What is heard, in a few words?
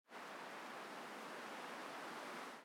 Defeat Wind; Smite; Susano